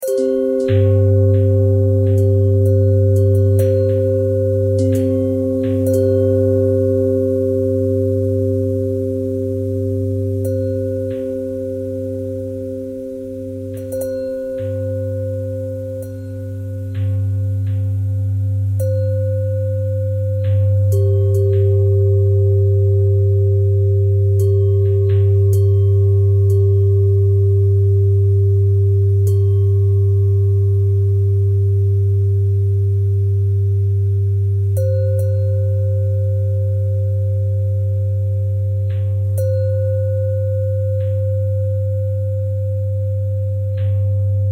bell thread simple

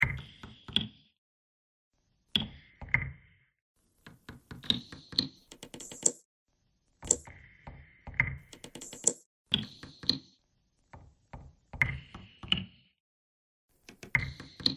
bagpipe thread noise

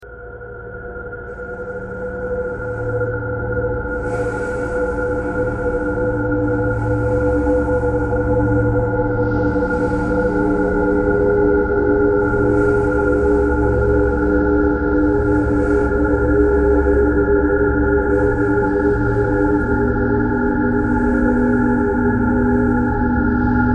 traffic core birds thread noise

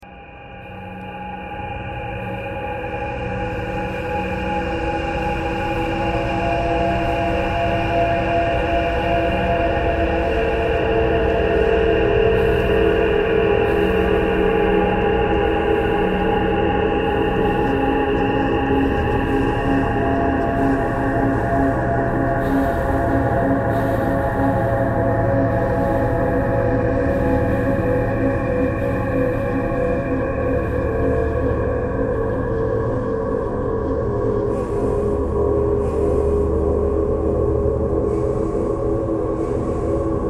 traffic core birds thread pentatonic

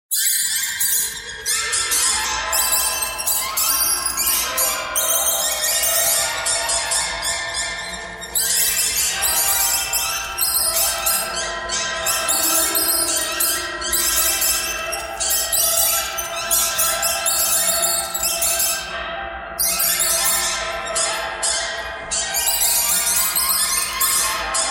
birds thread noise

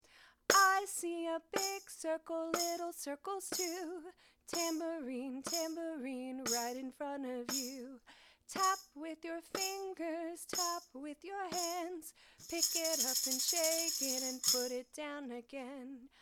Tambourine

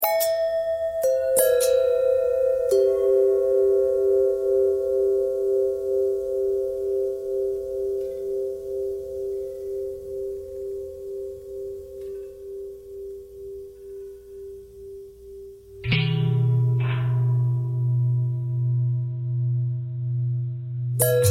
long bell long bell thread simple, bells

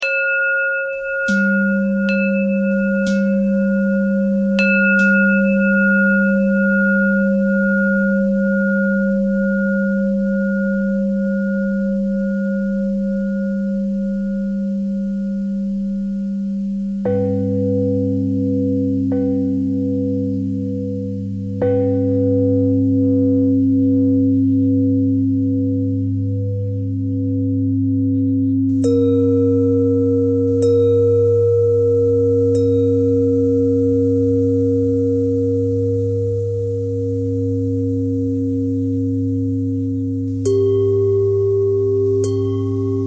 bell thread pentatonic

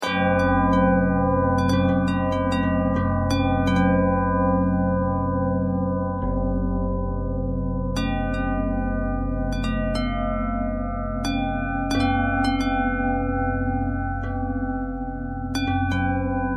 bell harmonic twist, bells

